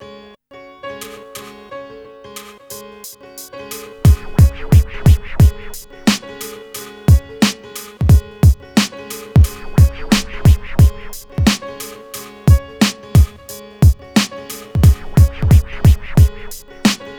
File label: Hip Hop R n B; USB Soundscan vol.19; AKAI